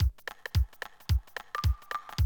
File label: drum loop, 100 BPM